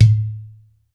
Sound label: UDU TONE; World Combo